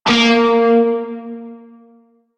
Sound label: Shawzin Oct Two A Sharp; Music; Warframe; Narmer Shawzin